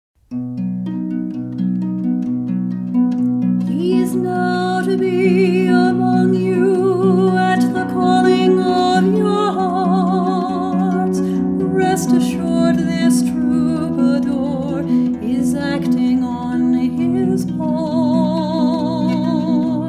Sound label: harp